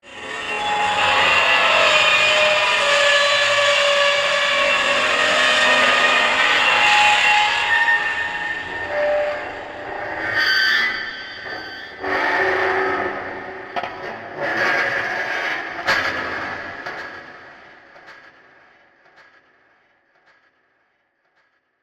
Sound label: SPRING horrify